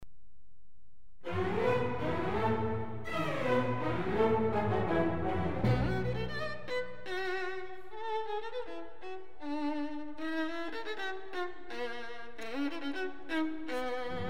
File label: Nocturne & Tarantella for violin; Karol Szymanowski